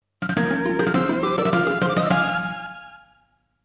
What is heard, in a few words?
jingle; Donkey Kong Country 2 OST